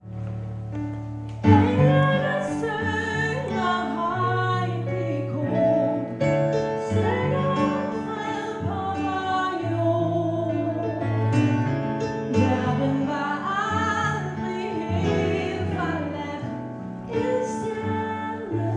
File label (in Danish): en stjerne skinner sopran